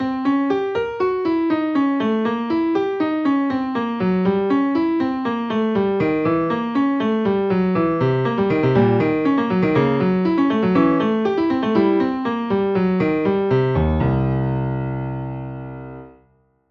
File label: Jazz Symmetrical Scales; How To Improvise With Symmetrical Scales; Octa Mel Seq